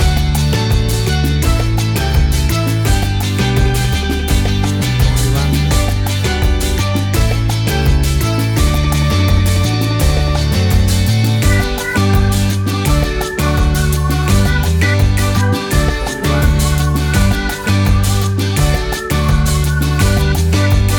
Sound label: Calypso Reggae Fusion